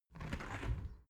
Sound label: window open soft